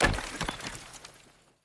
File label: block break; enemies; aquariumboss; unsighted